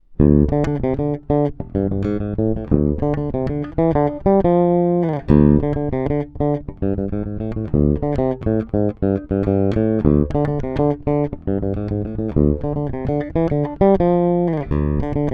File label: Bridge passive